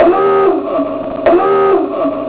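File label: dive, submarine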